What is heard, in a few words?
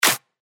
Clap; Columbia; revealed claps snares vol 2